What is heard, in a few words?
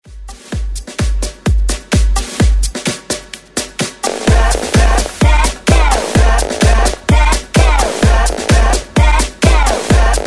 Hype Redrum 128 Bpm